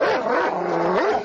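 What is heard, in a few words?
Dog Growl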